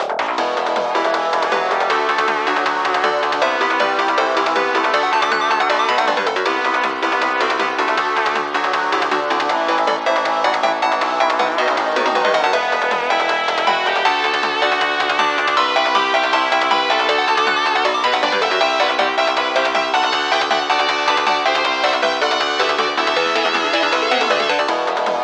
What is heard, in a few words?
beggining of fight ringtone